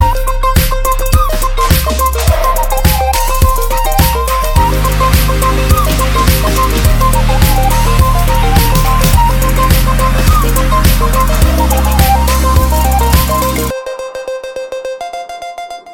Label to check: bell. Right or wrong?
Wrong